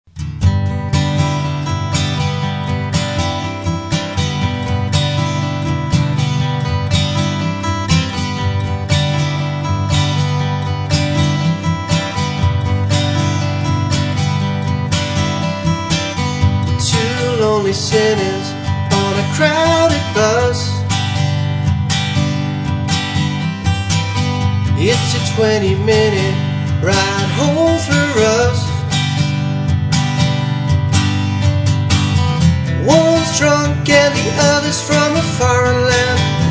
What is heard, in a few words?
Acoustic Track